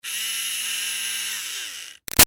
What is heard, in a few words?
Electric Motor Small Run Short; Machines; Big Room Sound